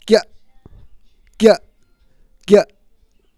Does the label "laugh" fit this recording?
Yes